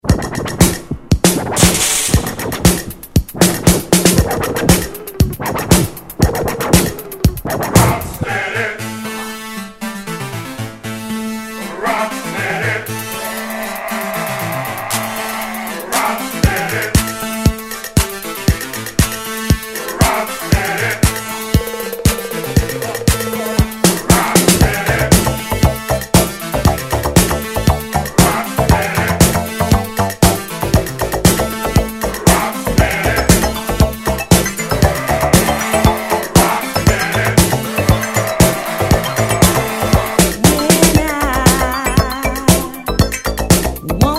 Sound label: Disco